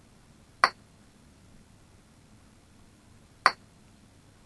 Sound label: solid and glass